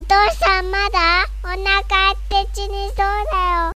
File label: japan kid cute, sms